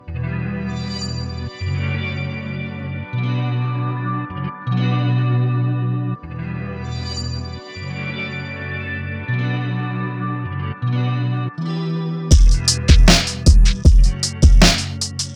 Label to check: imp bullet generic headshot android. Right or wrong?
Wrong